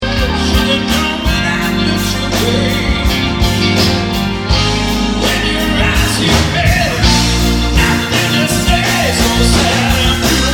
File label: Sad and Beautiful; Live; Deer Lake